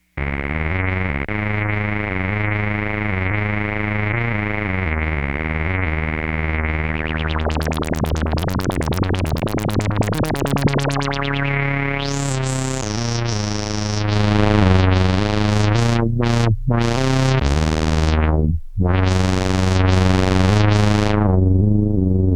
EXTERNAL AUDIO INPUT (Roland SH-101), Moog Micromoog